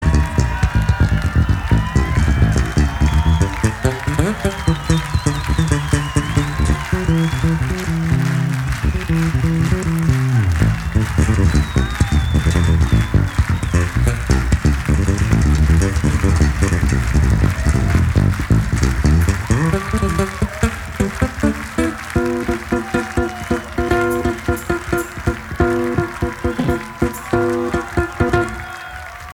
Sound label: Bass Jam